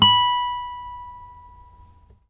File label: ACSMEDPICK, Guitar; 90 s Sample CDs; Quantum Leap - Guitar & Bass